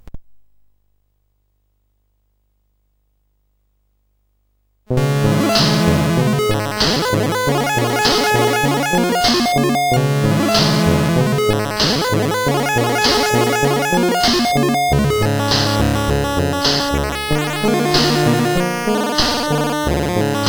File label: Wild Arpeggio, CSG8580R5